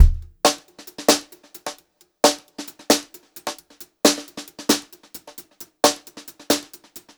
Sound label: Brushed Drum Loops; USB Soundscan vol.39; AKAI; 90 s Sample CDs; 133 HOTROD